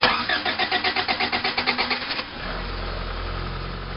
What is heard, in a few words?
startup, vehicles; chevrolet impala